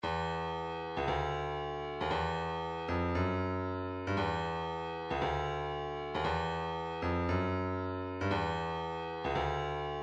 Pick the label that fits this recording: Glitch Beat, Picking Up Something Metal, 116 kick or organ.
116 kick